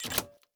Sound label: chat incoming message w stuff; Escape from Tarkov